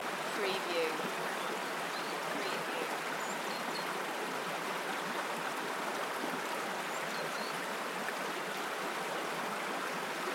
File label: Welsh forest ambience with stream; Moel Famau; beanstalk audio